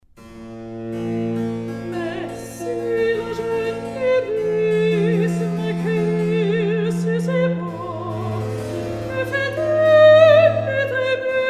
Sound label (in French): Recitatif; La Rose